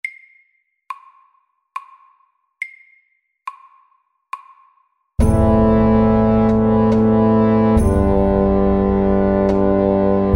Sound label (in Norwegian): Hemiola SAKTE